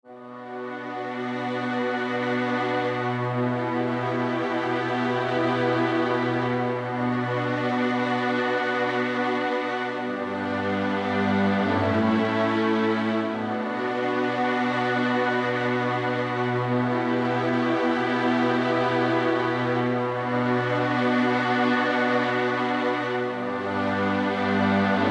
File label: Underscore Epic Hybrid; Narrative Mind